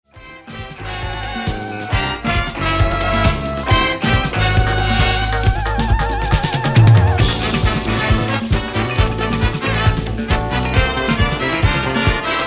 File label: Samba Funk